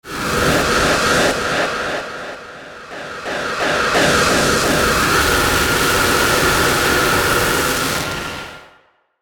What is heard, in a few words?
Distortion Delay Swell Attack; Blastwave